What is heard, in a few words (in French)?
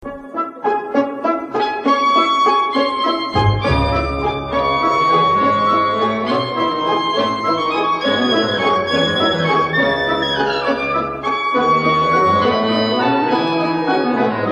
Musique classique